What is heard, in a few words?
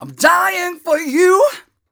m 130 mel d-flat, Vocals